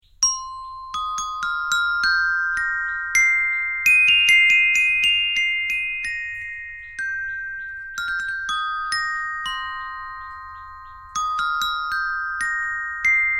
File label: metalophone normal one inch